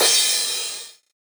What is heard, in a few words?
Drum Crash Cymbal